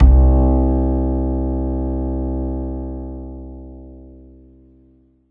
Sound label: Korg TR Rack Acoustic Bass C2